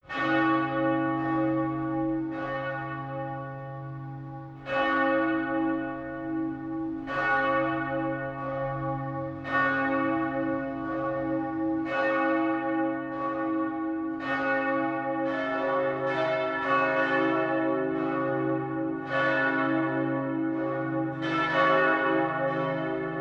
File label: Glockengeläut (Bell Ringing); Dominikanischer Choral; Vesperae in honorem Sancti Dominici; Orgelimprovisation